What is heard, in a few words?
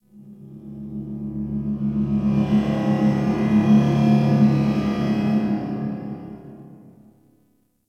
Galleon Low Ship Amb; Ambience; Warframe; Grineer Galleon